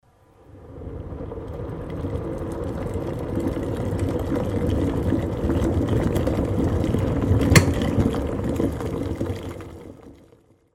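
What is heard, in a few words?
sound of kettle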